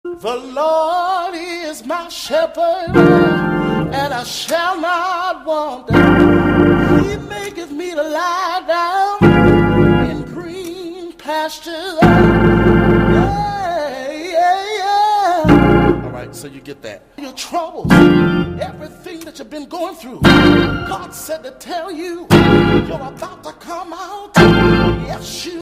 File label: organ shout